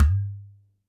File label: TABLA, PERC